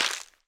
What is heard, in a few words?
Toon Splat